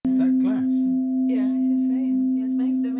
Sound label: singing bowl